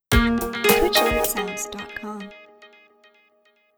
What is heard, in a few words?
Simple Host